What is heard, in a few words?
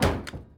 robot step; teardown; ps5 windows xbox series xs